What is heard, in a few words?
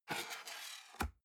cardboard box open unfold scrape single; objekte